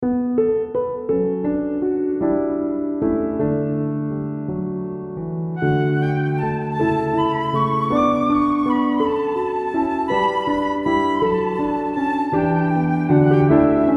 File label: INSTR